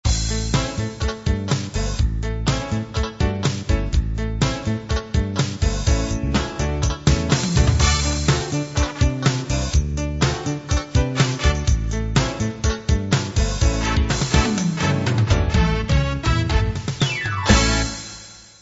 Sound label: r and b 8 beat